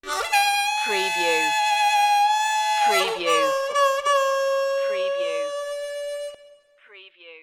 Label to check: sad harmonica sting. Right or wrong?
Right